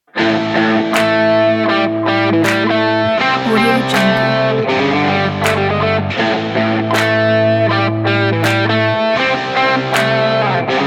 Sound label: hot sport rock; luxury-music